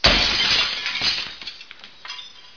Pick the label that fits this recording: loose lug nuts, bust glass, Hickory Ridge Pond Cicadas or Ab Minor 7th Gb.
bust glass